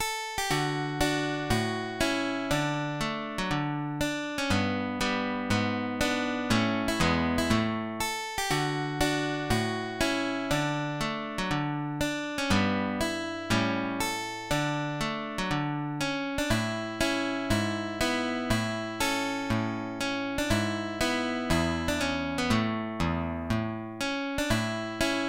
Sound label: March, guitar